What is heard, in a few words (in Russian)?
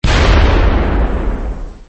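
боевой взрыв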